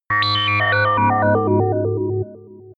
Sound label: sci-fi dark planet, digital